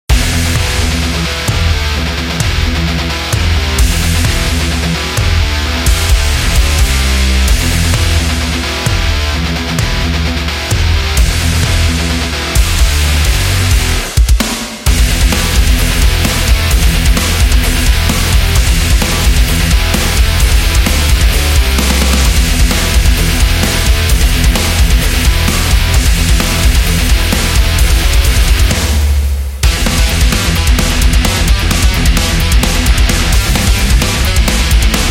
Heavy Metal Old

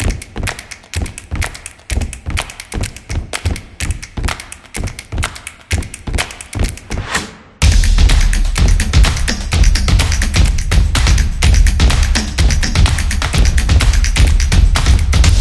Percussion Beat

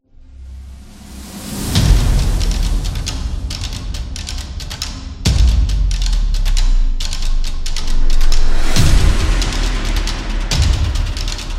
Horror Action; thriller

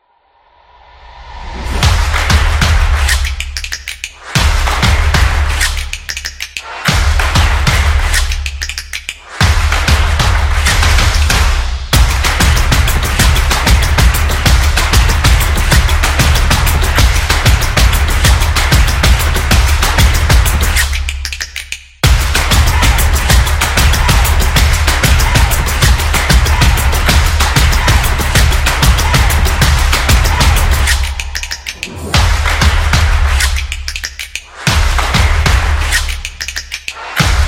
Energetic Intro; action sport

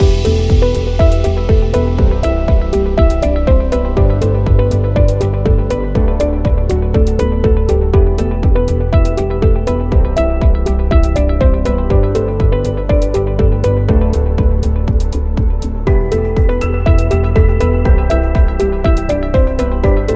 Corporate Loop Background; service business

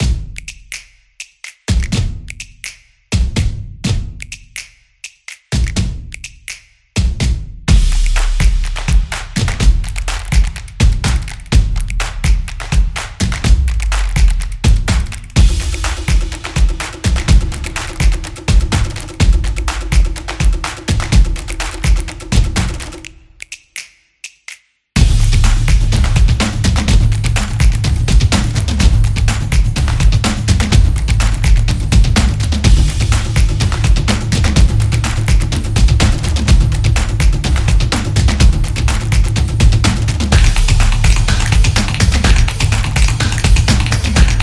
Drums Solo; samba

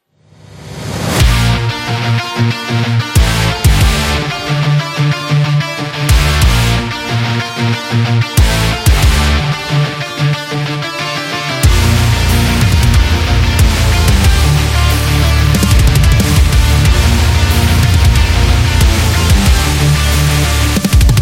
This Is Heavy Metal

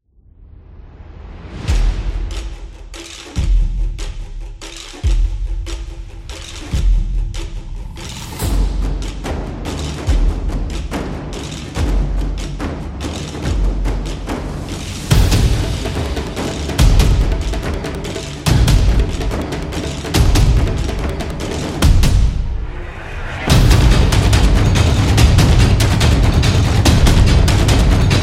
taiko Japanese drums

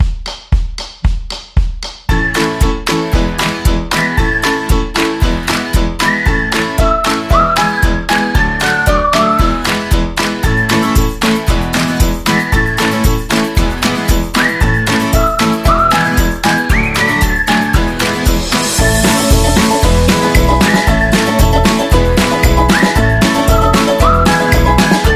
Fun Beat

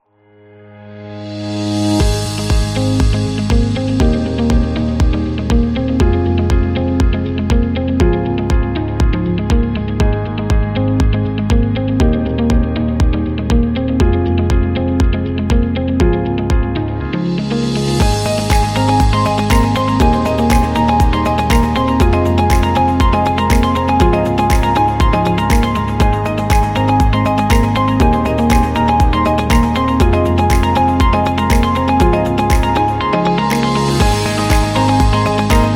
Educational; service business

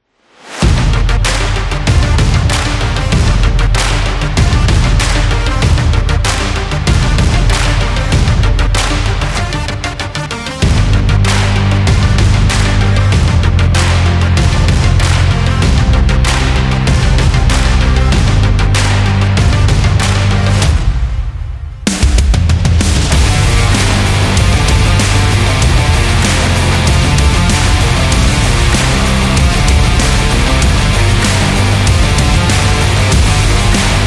Stadium Big Beat Rock

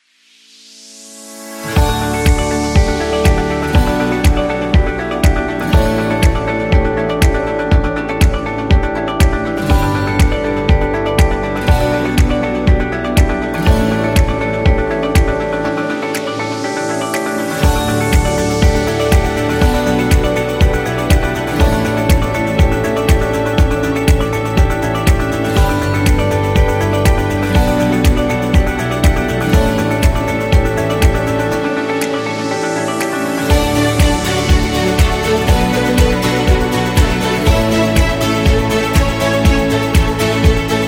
Inspirational Corporate; corporate background

